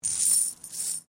Coin Penny Multiple Move Stone; Common coins; Money; Objects

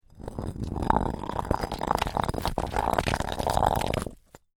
Rock Grind Scrape Slide Rattle; Medium weight rock scrape against uneven rocky surface. Deep rock slide sound.; Rock Sound Effects

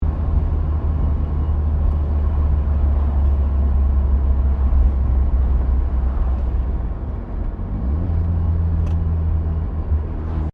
CAR MAZDA RX7 SERIES3 INTERIOR GEAR CHANGE DOWN; 1983; Cars; Vehicles; SoundEffects-Foundation1

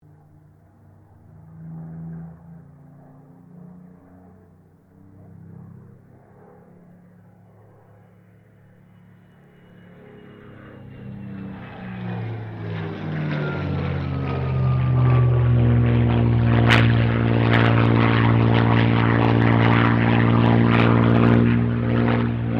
AIRCRAFT SUPERMARINE SPITFIRE MK-XVI PASS; Airplane Sound Effects; Vehicles

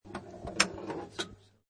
Pinball Sound Ball Roll And Hit Object Midways; Video Game Sound Effects

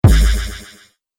Laser Percussion Shot, Laser Gun

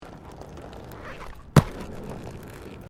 ROLLERBLADING BITUMEN SMOOTH JUMP; Sports

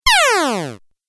Falling Video Game; Video Game Sound Effects; Falling - Video Game 07

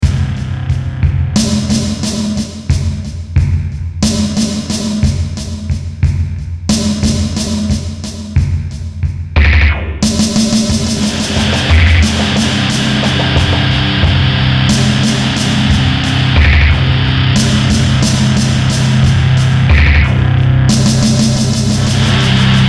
BEAT ROCK SLOW, Beat Rock Slow Intense; Miscellaneous Loops; Blastwave

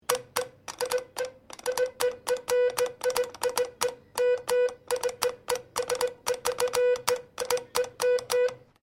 MORSE CODE RANDOM SEQUENCE; Morse code aplphabete; Electronics

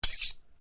Blade Swish, Sword; Foley sounds for melee weapons; Swords; Combat; SoundEffects-Foundation1

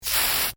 AEROSOLE SPRAY CAN SPRAY LONG, Can of spray paint; Spraying; Objects; SoundEffects-Foundation1

